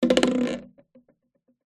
plastic bottle empty knock over

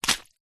foley footstep single hard soggy wet grass mud

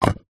brick stack on top of another; foley

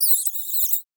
animals insect chirp vocalization pain; sound effects one

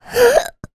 human child 4 years boy hiccup; zapsplat

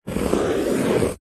pen draw long line on card back of paper pad; office

